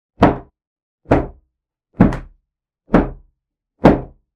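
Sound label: towel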